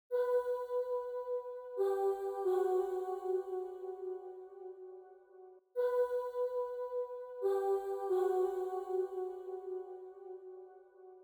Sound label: Pad, 085 Bmin